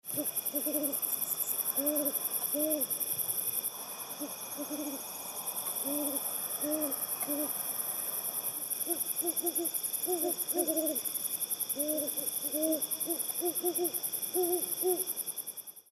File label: OWL GREAT HORNED; species/birds; wbba